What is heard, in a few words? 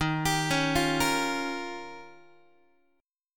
Eb 9th, Guitar; arpeggiated; x,11,10,11,9,9; JGuitar